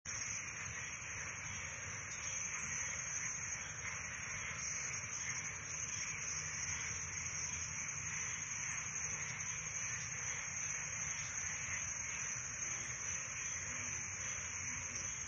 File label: crickets